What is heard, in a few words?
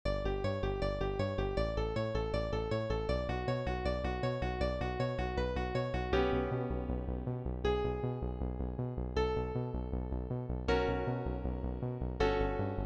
accompaniment transposed; flashdance vocal audition materials; KEYANO THEATRE COMPANY AUDITIONS